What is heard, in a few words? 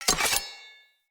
Dagger Equip; Weapons; Dendrit